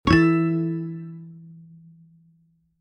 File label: guitar